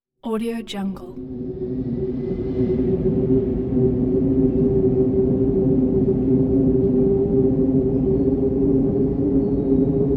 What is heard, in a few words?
wind howling